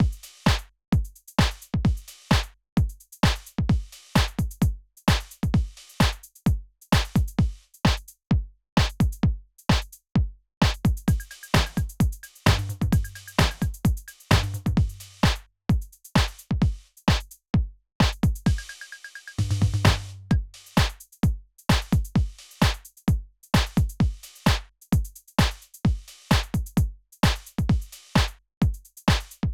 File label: Boom Beat